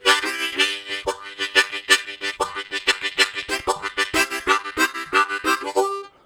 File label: Harmonica, WAV Riffs; Zero G